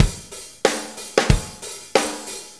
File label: Shuffle Loop, Full Kit Loops